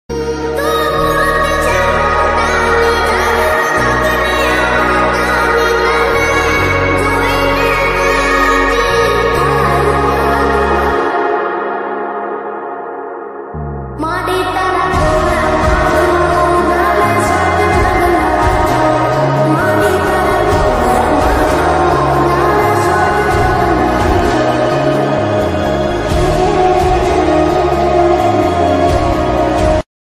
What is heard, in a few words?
ringtone gujarati ringtone new ringtone gujarati ringtone